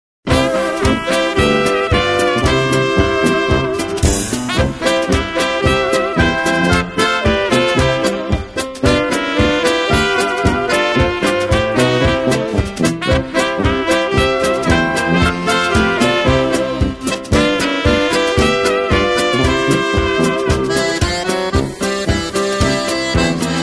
Polka

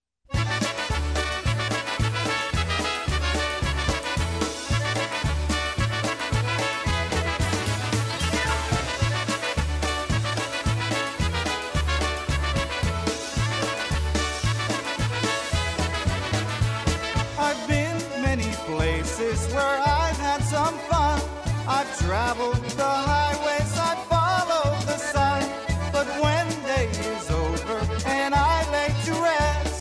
Polka

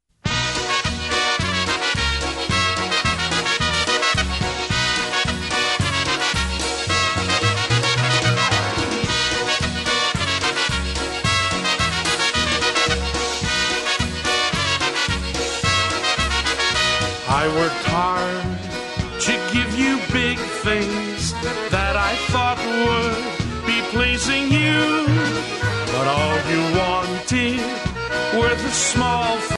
Polka

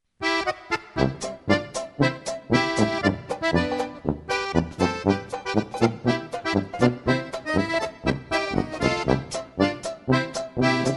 Polka

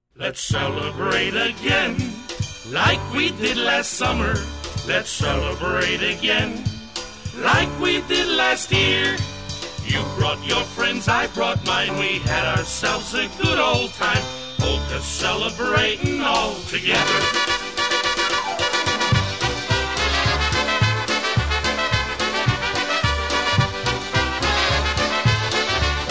Polka

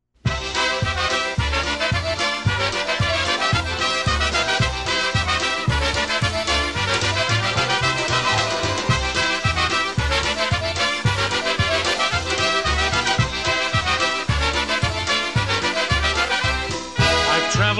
Polka

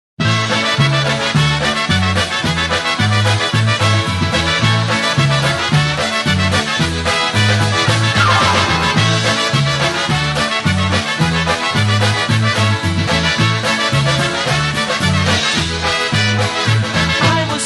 Christmas Polka, Push Style

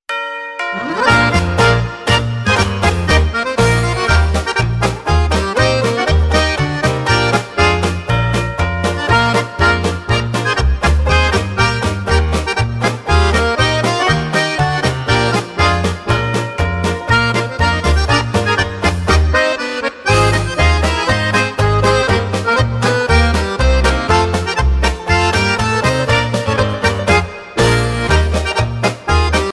Christmas Chimes, Polka

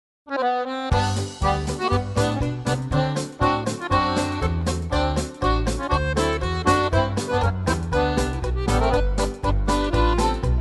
Polka

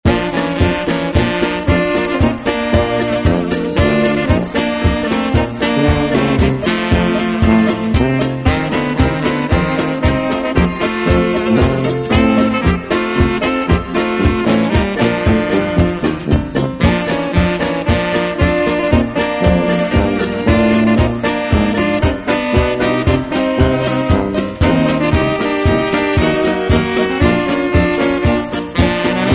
Polka

